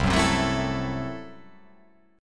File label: C 6th Flat 5th C down